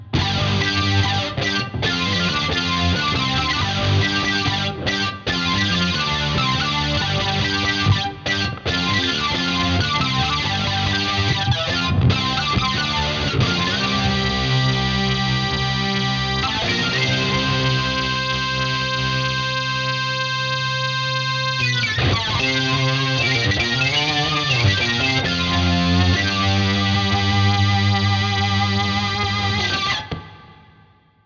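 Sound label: Headrush Synth Sync Lead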